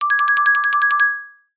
comedy marimba flutter or shake long high pitch; sound effects one

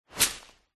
foley tree leaves branch whoosh impact designed

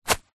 foley footstep single grass; zapsplat

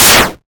leisure video game retro 8bit explosion or gun

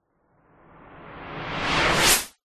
magic wand reversed whoosh build; zapsplat

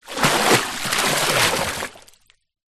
nature water splash movement pour into deep water; zapsplat

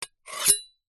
kitchen knife large scrape on metal; household

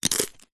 human eat bite single corn chip; zapsplat

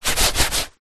office chair executive rub seat fabric hand

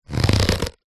latex glove pull stretch squeak; foley

